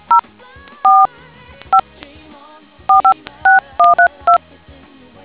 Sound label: dtmf